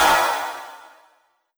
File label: snare hollow G#